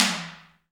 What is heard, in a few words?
T DRM, Heart of Africa; Spectrasonics